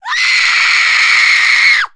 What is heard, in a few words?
woman scream; DeadFright